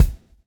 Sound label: swing